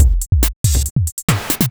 Beat, Drums & Percussion; Electrocution; Beats

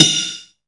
MIX SD; AKAI S6000 CD-ROM - Volume 1

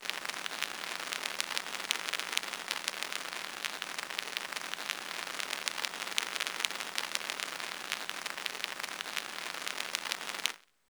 Phono Noise, Roland BK-5

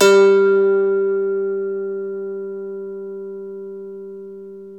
IRISH HARP

